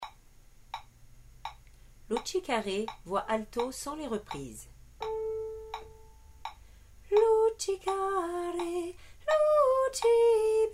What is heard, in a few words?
alto; luci care